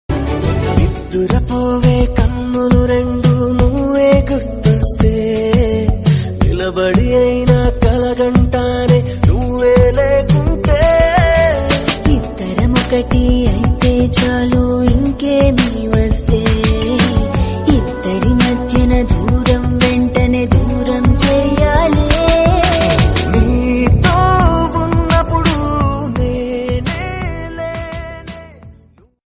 telugu ring

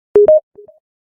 Nokia Notification Tone

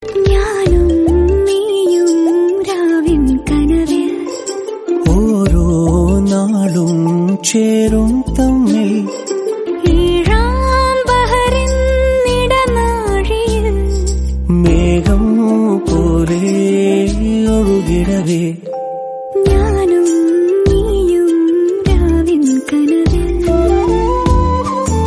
malayalam new romantic song, ringtone

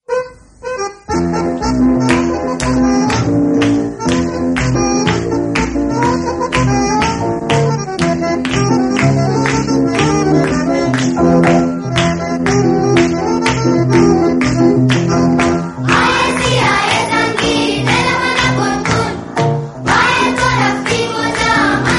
new song bgm, ringtone

